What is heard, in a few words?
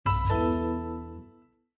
Windows Logoff Sound